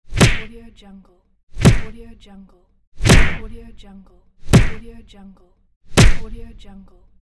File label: punches; bitstate